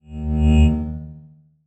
Transition Musical Tone Short; Production Element

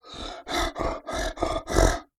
Animal Mammal Carnivore Grizzly Bear Breath; animals; AdobeCC

